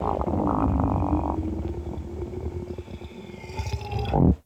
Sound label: Venus Landscape Mushroom Creak Alt, Ambience; Warframe; Venus Open World